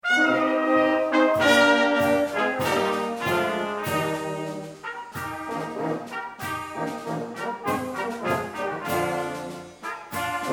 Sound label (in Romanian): Fanfara; Luni - Pastele Domnului Isus